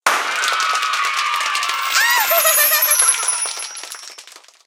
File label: bubble welcome popup